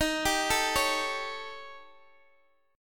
D sharp 7th Flat 5th, D sharp, down, Guitar, Open G